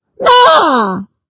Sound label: Tail poke; My Talking Tom; Android iOS